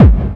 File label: KICK PRO -R, Disco House; USB Soundscan vol.20 - Fresh Disco House I